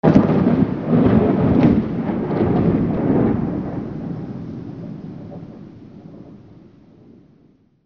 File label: RAIN